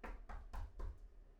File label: knock; IEEE AASP